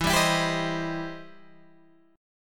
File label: E Minor Major 7th Sharp 5th, Guitar, Open G; down